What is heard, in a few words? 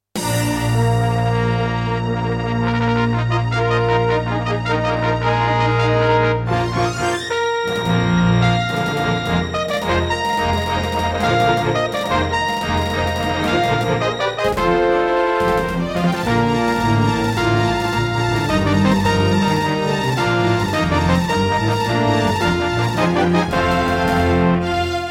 Intro, Roland SC-88 55MAP; Dark Forces